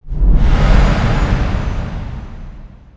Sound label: solar bind target; weapons; dota; keeper